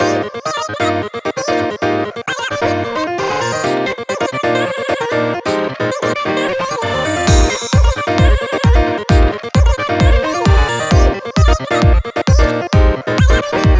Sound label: future pop house; weeklybeats 2024